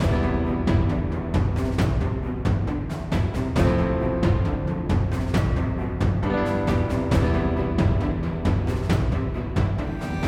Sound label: Boss Loop